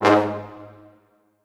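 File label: Orchestral Brass, G sharp 1; Best Service ProSamples vol.20; AKAI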